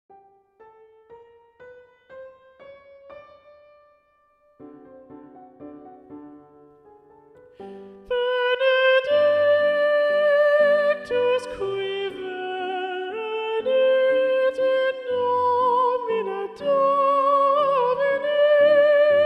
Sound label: Soprano; Mass No2 in G Mvt 5 Benedictus; Practice Room